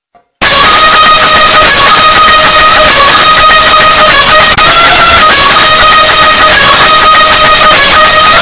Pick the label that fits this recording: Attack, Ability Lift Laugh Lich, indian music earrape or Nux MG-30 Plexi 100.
indian music earrape